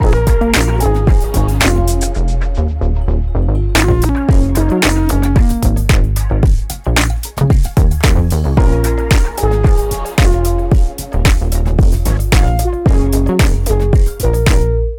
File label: Tick Tock